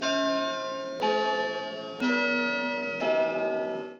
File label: countdown; townsquare